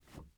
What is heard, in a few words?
wood jump